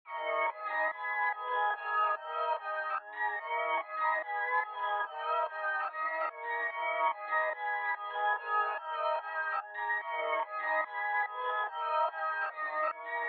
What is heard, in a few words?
145 BPM G# Min High Melody, melody loop stem; Trap; Cymatics; 2022 Melody Collection; Trap Pt 2; Operation